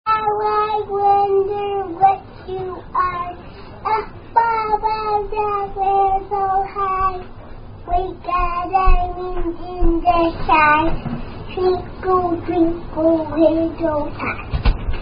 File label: Twinkle Twinkle Little Star; Ghoulie Games